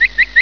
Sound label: Birds; Grand Central Pro